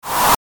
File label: retro; maoudamashii